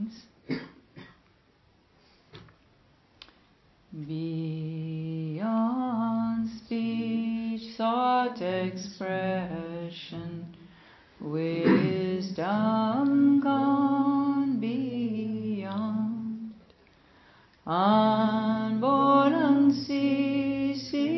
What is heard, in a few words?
Heart Sutra Chant; Homage to Heart Sutra